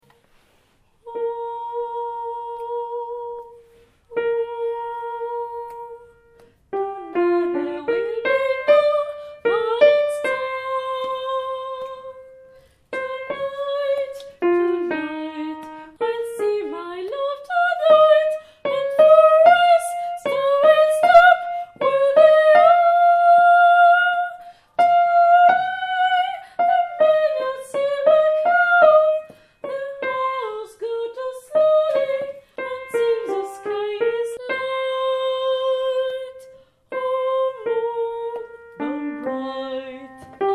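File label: soprano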